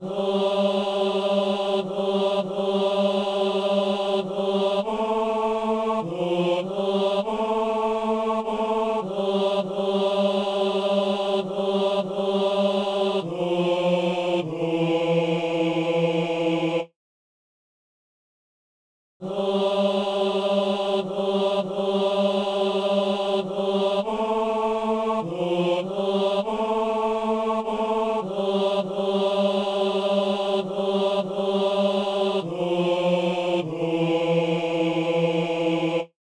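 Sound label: tenor; Kyrie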